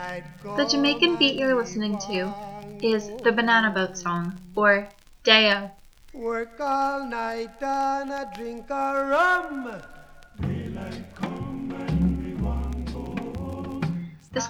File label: Banana Boat Day O Orchestra and Chorus